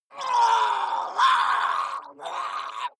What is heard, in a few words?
water monster female gargle scream angry long; koerpergeraeusche; comedy-cartoon; soundpool